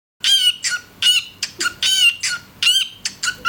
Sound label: Recording, bird